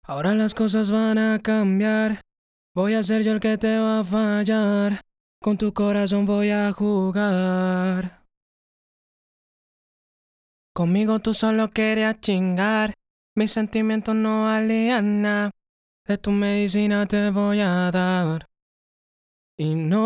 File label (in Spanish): VOCAL SOLA